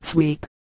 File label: sweep